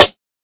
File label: 00s sparkly snare sample g key